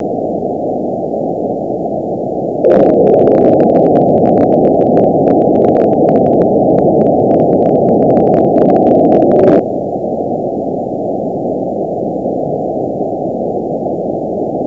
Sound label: Noise, 160